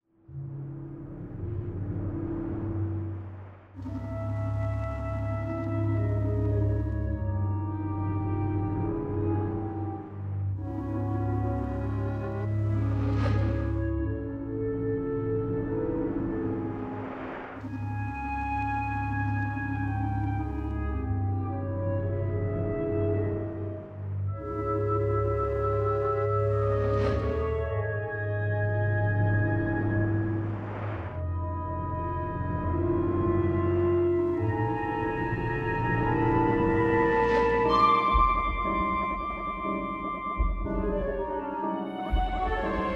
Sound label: Spooky Sad Remix